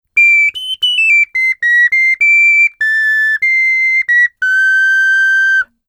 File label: Micro jingle, ocarina